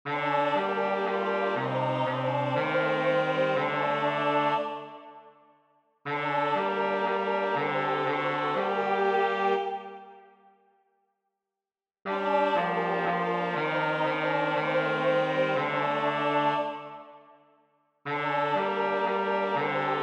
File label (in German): klage bass